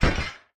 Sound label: Drop Metal Object